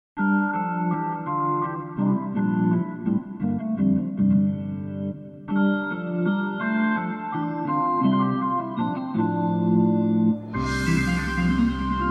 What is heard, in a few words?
keys; ROLAND Library Preview CD SV-SP70-01